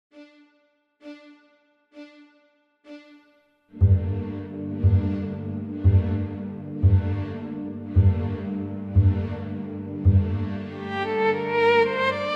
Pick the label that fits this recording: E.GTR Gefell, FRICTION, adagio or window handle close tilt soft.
adagio